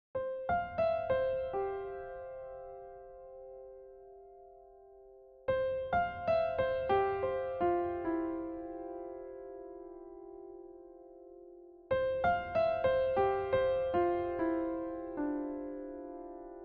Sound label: Sketching Piano